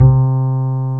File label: BASS D#; AKAI S-Series CD-ROM Sound Library VOL-8